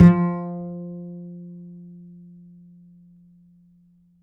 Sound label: G, G string upright bass; Upright Acoustic Bass